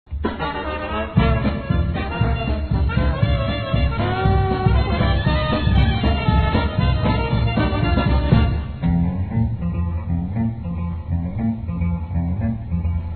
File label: dixieland